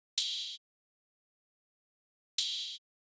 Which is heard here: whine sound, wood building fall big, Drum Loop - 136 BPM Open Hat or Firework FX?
Drum Loop - 136 BPM Open Hat